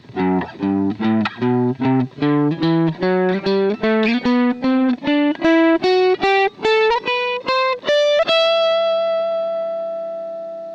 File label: G major scale, guitar